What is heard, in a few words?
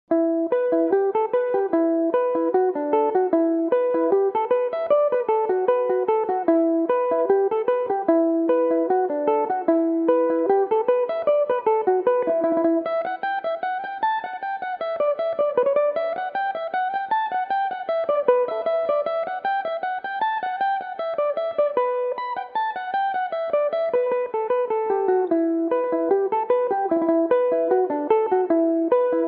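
E minor, Irish mandolin; Man of the House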